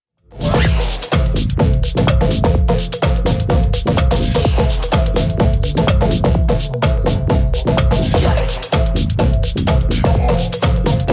drum talk

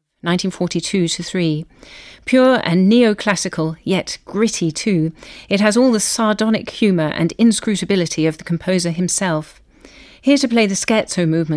sonata for flute piano in d op 94 2nd movement; Sergei Sergeyevich Prokofiev

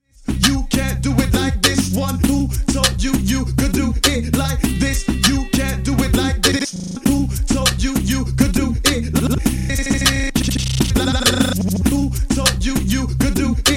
instrumental

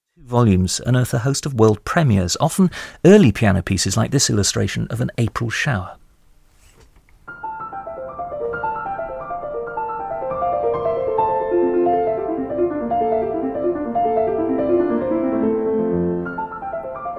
concerto no 2 for piano and orchestra; William Alwyn

